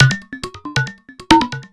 percussion loops 138; PS-45

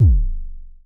Mild Thump Kicks F#0, Vermona Kick Lancet; LookIMadeAThing Kicks; M8; Mild Thump Kicks Samples

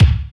BD, BD Dance; Sound & Vision; Gigapack I CD 1

